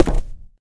wood, footsteps, walk

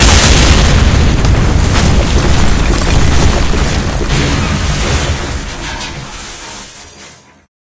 cart explode; Source Engine; tf

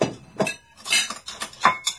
dinner plates, ambient materials; Source Engine; video game

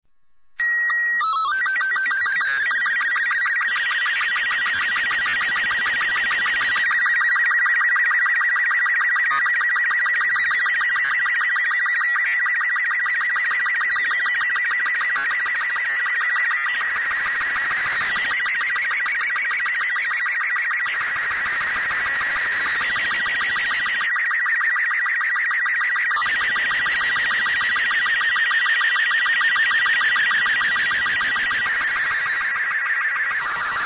ambient; Portal 2; Source Engine; video games

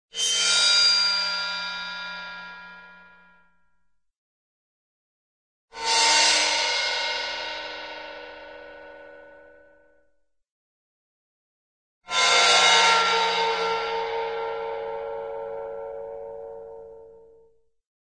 magic bowed cymbal; cartoon

